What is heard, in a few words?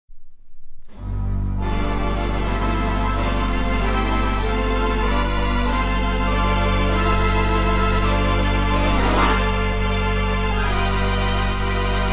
theatre organ